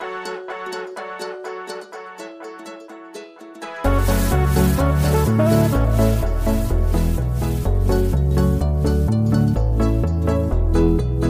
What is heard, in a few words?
Pop